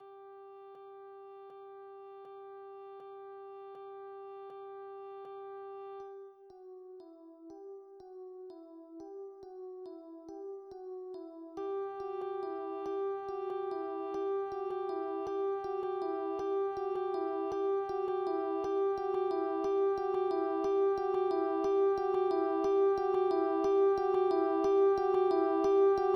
BELLS

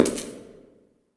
Dungeon; kult

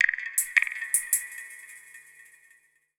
PERCUSSN, Ambient, Drum

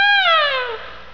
Riff; The Cruncher 1.1 Demo; Cruncher Candy

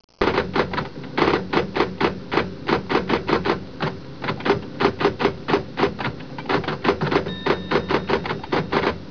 TYPING; Talking E-Mail

